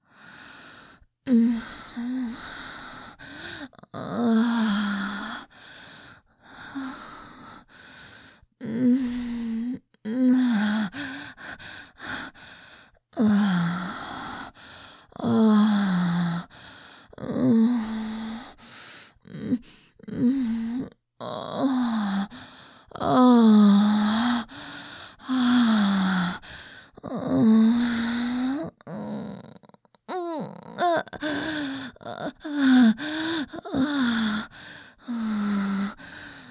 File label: Moans - Slow and sensual